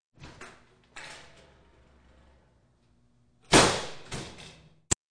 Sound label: Track, Doors; 헐리웃특수효과음; 문 소리